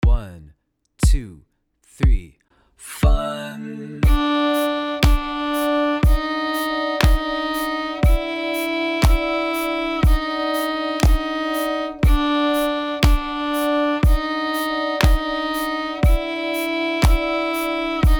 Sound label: D chromatic, fiddle